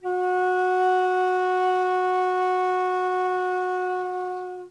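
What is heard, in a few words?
F sharp 4 with F key, flute; classical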